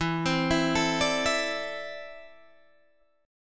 E 7th Suspended 4th Sharp 5th E down Guitar Open G